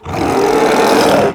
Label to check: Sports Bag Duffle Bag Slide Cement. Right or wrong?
Wrong